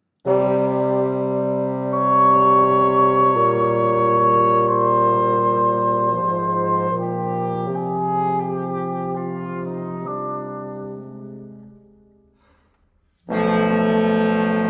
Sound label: Wind Quintet; Op. 43; III. Prelude. Adagio; Theme and Variations. Un Poco Andantino